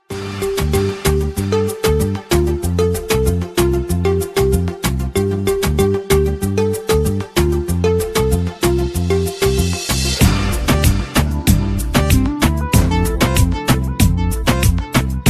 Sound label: bass ringtone; bass treatement